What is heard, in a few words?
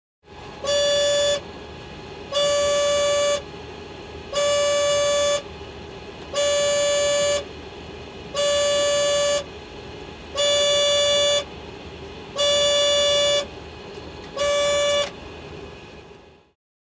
Baggage Carousel Machine Beep